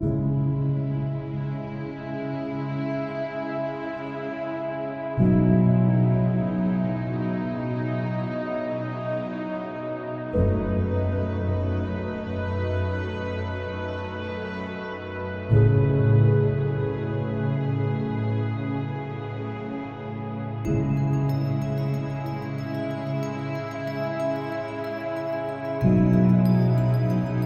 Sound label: Emotional